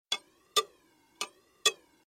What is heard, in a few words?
Funny Msg Tone